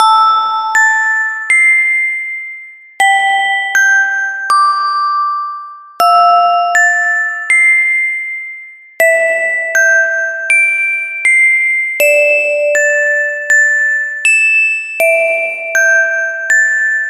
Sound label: 80bpm